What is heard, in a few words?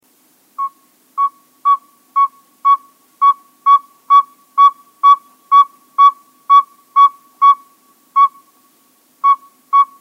Northern Saw-whet Owl